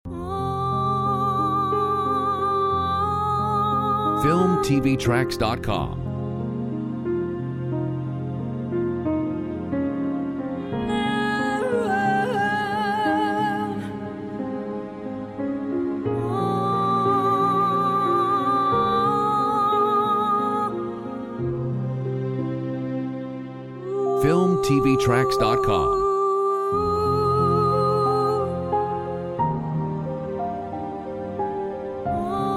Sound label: Emotional Aftermath Vocal